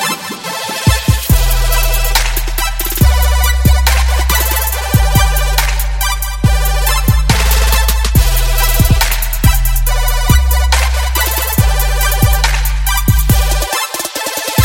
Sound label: Trap